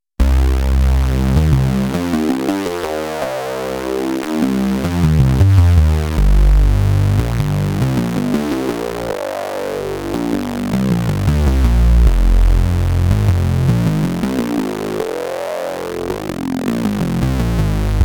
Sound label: oscar bass